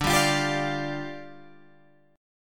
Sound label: D Minor Double Flat 5th, down, Guitar, Open G